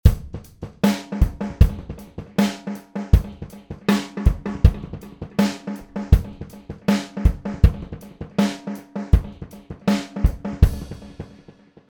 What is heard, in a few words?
echorec drums